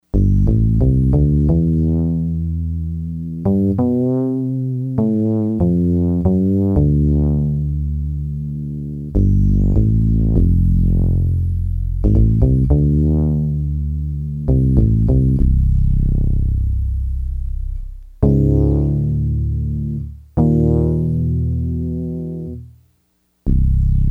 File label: Fretless, Yamaha DX100; Factory Patches